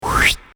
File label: Woosh